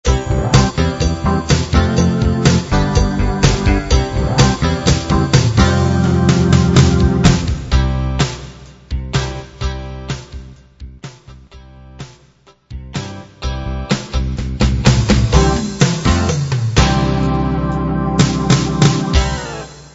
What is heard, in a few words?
Open Rock